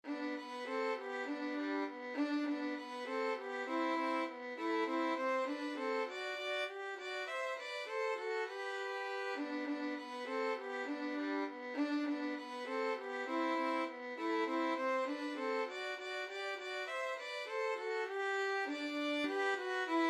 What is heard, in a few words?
jingle bells, violin duet